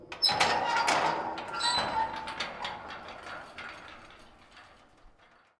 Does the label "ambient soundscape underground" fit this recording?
Yes